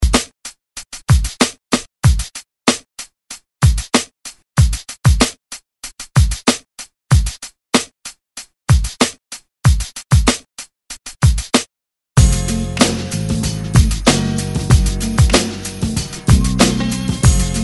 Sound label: 90s Re Drum 95 Bpm; DJDOLLS